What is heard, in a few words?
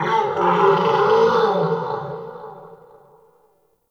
Forest Distant Creature Medium Cry; Warframe; Grineer